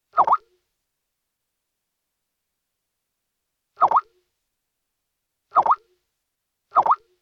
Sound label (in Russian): skype soobschenie